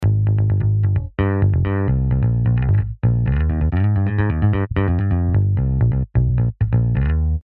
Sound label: Bass; HALion7